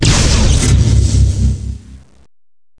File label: fire laser Heavy